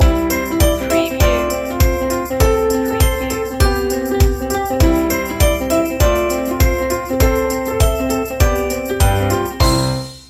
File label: one more step stinger